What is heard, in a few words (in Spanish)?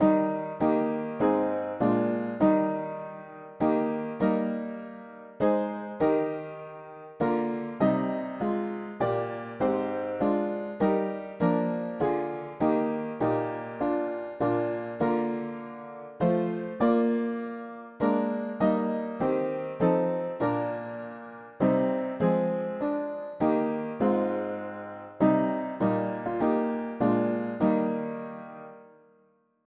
mono piano; hymns; Himnario pequeno